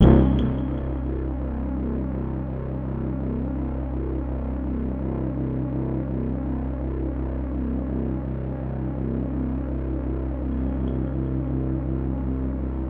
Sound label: C3, SynthLine; 90 s Sample CDs; NiceBeats; SynthLine VOL-4